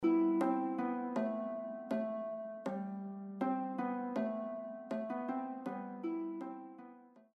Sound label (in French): 6 cordes, lyre; Voici une chanson traditionnelle de marin datant du siècle dernier et provenant de Cornouaille Armoricaine en Bretagne; Tri Martolod; Lyre Academy